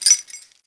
keys

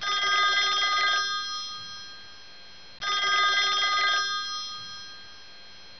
tele ring

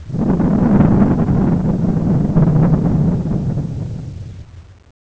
city sky beam

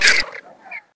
die, Snark; Half-Life

